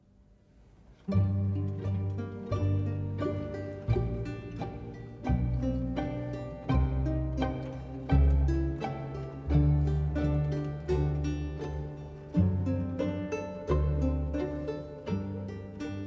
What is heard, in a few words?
adagio from violin concerto